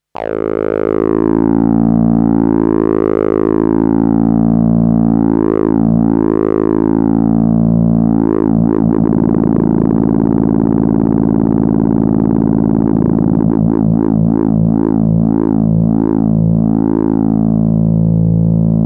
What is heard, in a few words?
SY 1 Filtermodulation raw